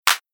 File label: Future Bass Rhythm Clap Non Reverb